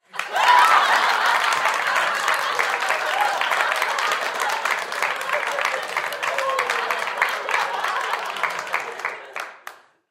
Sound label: Human Crowd Studio; Crowd Reactions Laughter Clap Loud Long